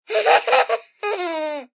Kids Laugh, Male, child, throaty giggle, kids, cute; speaking, (human voice); Talking Sound Effects